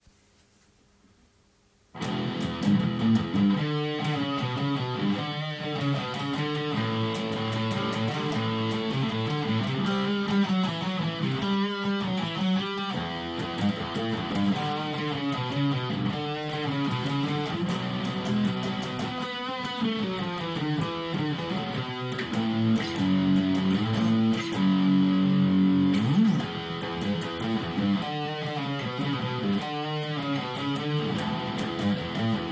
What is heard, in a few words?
Rock‘n‘Roll